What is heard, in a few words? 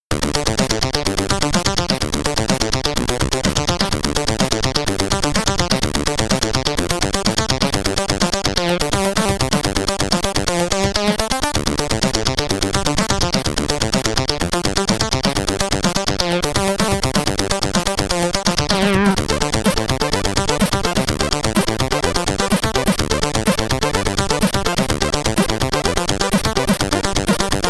Boss Theme, SID Stereo, commodore 64; Harald Hardtooth